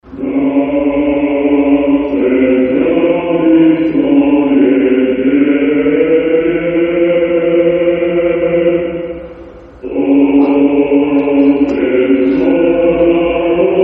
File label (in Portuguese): música polonesa